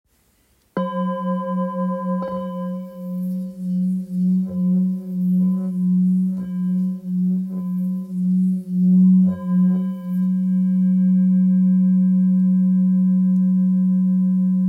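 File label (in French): Ancien Do, bols tibétains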